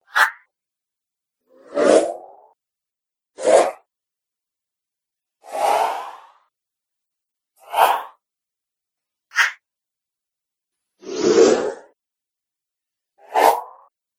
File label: Strong Wind Whooshes; Whoosh Sound Effects